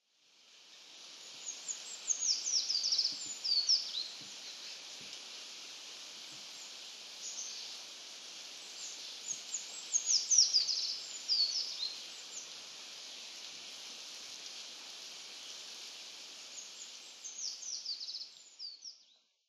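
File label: kibashiri, Eurasian Treecreeper; chichibu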